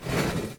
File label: Door Slide Tile; Asbourg